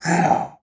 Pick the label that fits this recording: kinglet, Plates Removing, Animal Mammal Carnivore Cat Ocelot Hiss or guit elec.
Animal Mammal Carnivore Cat Ocelot Hiss